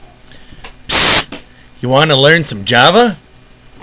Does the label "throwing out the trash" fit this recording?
No